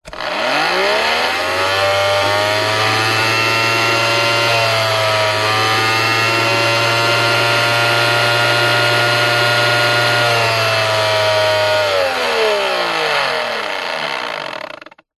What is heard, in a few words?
industrial pump electric handheld on off med burst close to motor powerful